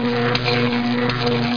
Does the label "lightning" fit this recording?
Yes